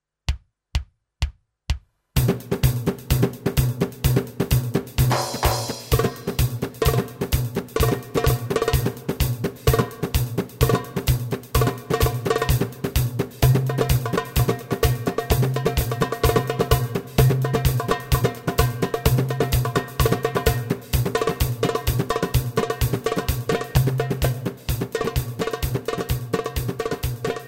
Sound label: tabla